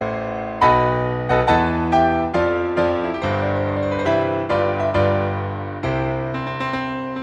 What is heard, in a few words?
wedding march song